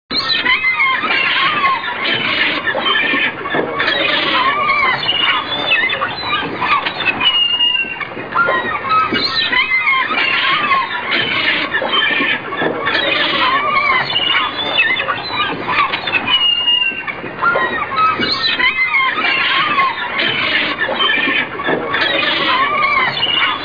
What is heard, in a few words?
Seagulls